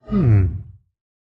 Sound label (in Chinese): 待机声; 嗅探兽